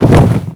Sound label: wingflaps heavy; electrodactyl cursed